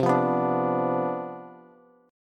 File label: C Major 7th Suspended 2nd Suspended 4th C down Bass, chord; CM7sus2sus4 strummed; JGuitar